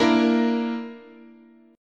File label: Bb Major Flat 5th, guitar chord